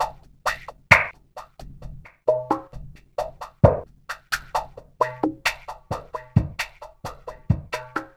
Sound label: PERC, LOOPS; Spectrasonics; Metamorphosis